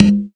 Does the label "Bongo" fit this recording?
Yes